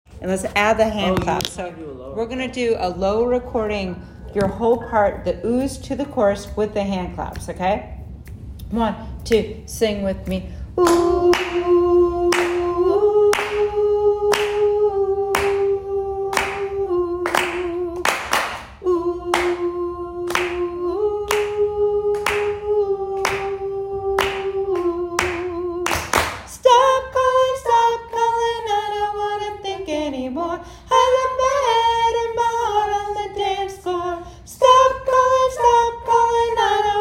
Low Harmonies

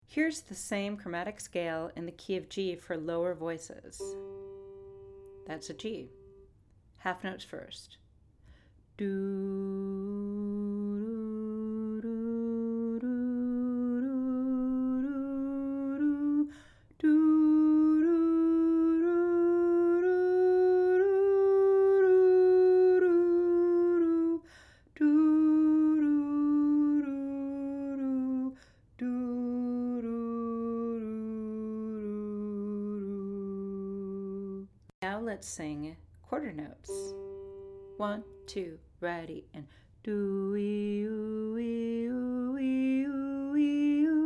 Chromatic Scale G

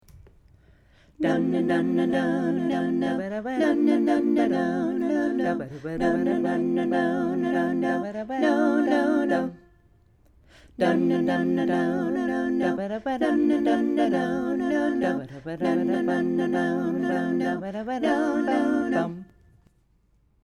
Two Chord Ex Bass

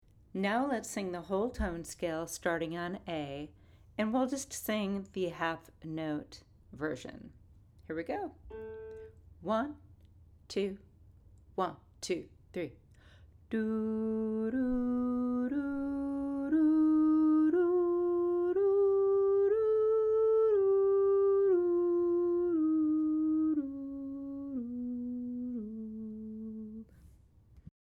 Whole Tone Scale A

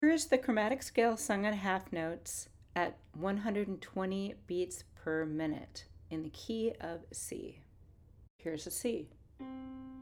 Chromatic Scale C

